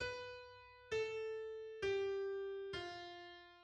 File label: Locrian tetrachord